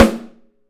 Studio Snare, 3-D Audio Collection, 3D Percussives; E-MU Producer Series Vol. 5